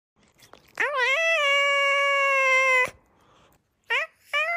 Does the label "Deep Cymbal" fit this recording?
No